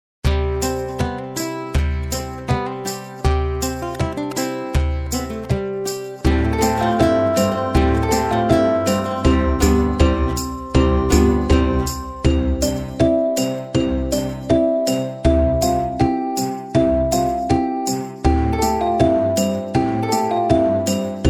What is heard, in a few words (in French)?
chanson frere jaques medieval musique; chanson de paques